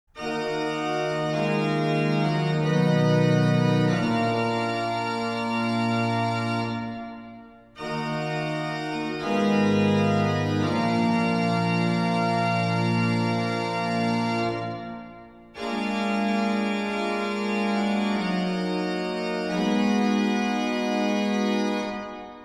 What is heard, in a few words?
Funeral Music (organ); Three Colors - Blue